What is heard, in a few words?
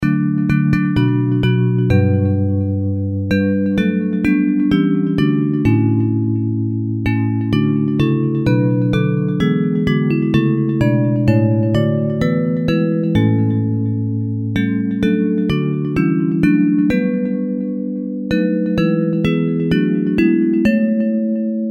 Bells